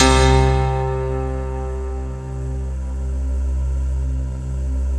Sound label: JV-1080 BELLS PNO; Syntec; Wall of Sounds